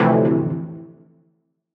Am Sweetness, Chords; Electronisounds

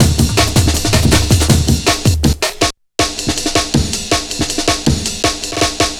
160, drum loops, drum & bass; Total Drum&Bass

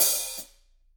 HH, Drums; Bob Clearmountain Drums I

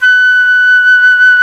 jazz flute

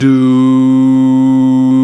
VOX, Male Scats

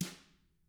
TAMA drums; ILIO; Double Platinum Drums 1

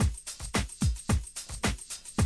Classic DrumLoops, 110

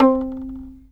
fiddle pizz C4 R; Sampleheads; Whole Lotta Country 1 & 2

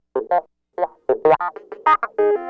Clavinet, 70s Analog Funk

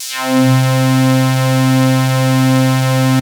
Big Synth Basses

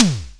Snare tom, drum hits; Zero-G; Soundclash

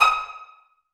STRING PIZZ D#4; Orchestra; Sound On Sound - Peter Siedleczek's Orchestra

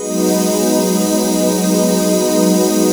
Pretty Pads; E-MU Formula 4000 Series Vol. 1; Hip Hop Nation

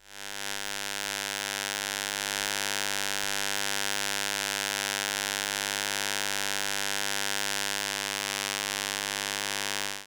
Electromagnetic Fields

